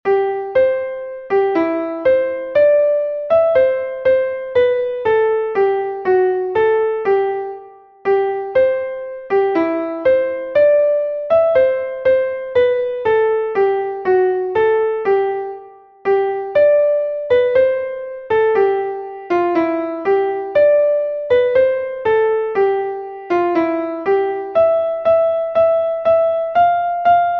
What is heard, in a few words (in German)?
klavier; MelodieMeister